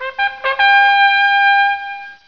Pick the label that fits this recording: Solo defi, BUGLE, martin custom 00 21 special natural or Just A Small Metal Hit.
BUGLE